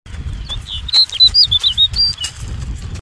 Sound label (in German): Schneeammer; Ruf